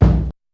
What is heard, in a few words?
KICK, Big Beat; Big Beat Samples & More; AKAI